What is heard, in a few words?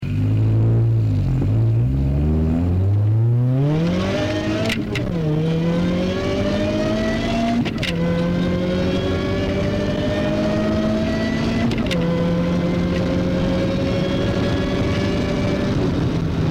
0 to 100 WOT